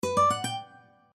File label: new record